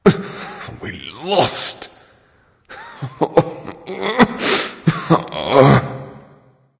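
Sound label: non-verbal boohoo; smite; voicepack; houyi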